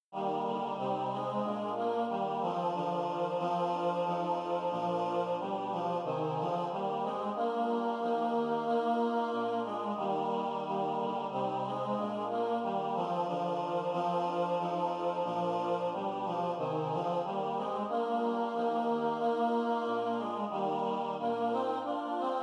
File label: tenor emphasized, choir; hymnal 1982; 91 break forth o beauteous heavenly light